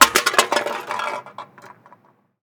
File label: drink can fall